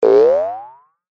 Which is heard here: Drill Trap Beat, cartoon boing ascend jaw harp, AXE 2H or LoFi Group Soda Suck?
cartoon boing ascend jaw harp